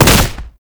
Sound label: Arcade Automata Impact; Gameplay; Warframe; Nineteeen Ninety Nine; Deep Archimedia